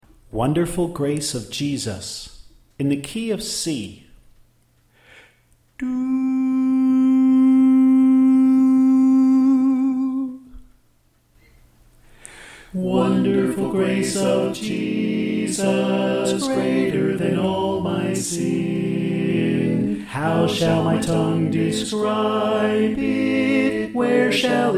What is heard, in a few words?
Tenor Left